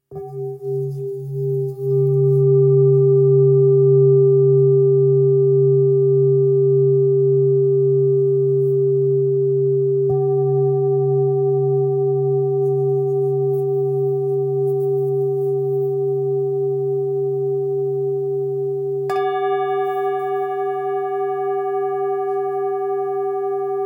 Bol super lune belier sol; Bol Chantant Full Moon

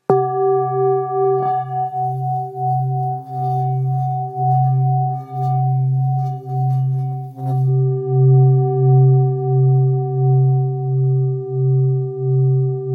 Bol bengale sol

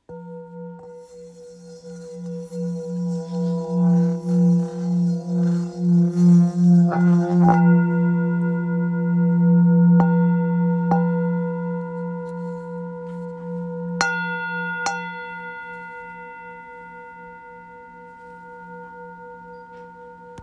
Anciens mi 725g, Bol Chantant Tibétain

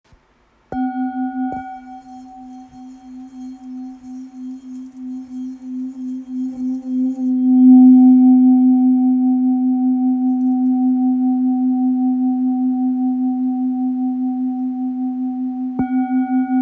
Full moon fa diese

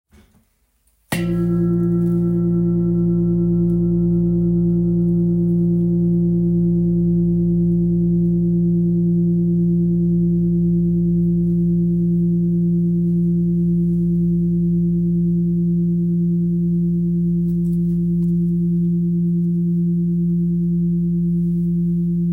Gros bol grave la 10.37kg; Bol chantant gros volume 4kg et +

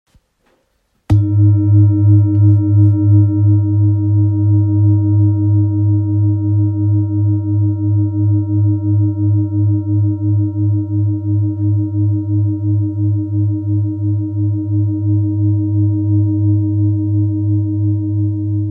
Bol full moon 2.02kg

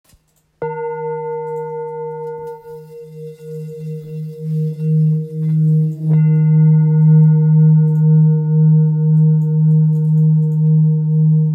Bol Thick re diese

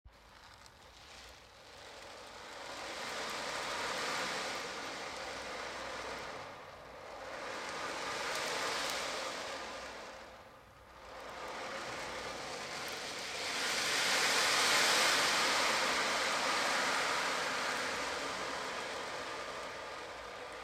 Tambour ocean; Instruments et Tongue drum

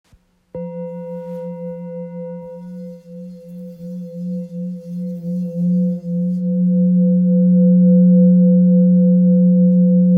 Bol full moon plat 1.35kg

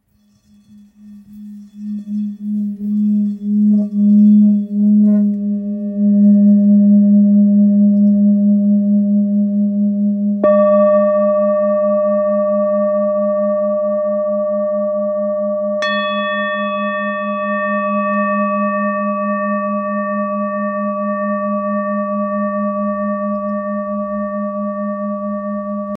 Bol full moon sol diese

